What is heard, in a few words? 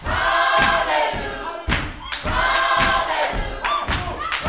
Vocal Gospel PHRASE